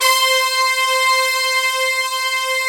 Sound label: FULLBRS C5, Synth City; FULLBRASS